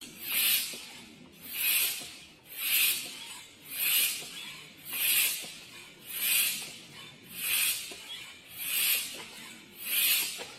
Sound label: air pump